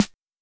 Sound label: Snare, Casio PT-68; Drums